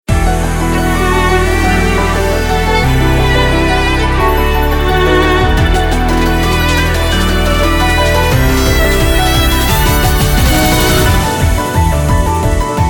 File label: BGM Battle Running; aster tatariqus android ios mobile gamerip 2023